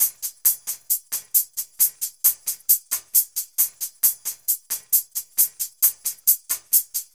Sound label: 134 House Shaky Hi-Hat; Dance DJ; Drums; Hats; Pro Tools Essential Loops; Digidesign